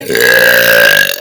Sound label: burp